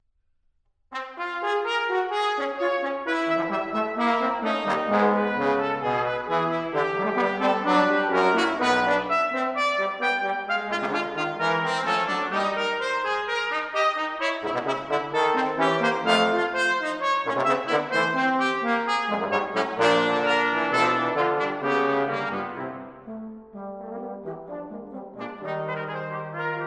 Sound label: Five Engravings in Brass, Allegro fugato; Incisioni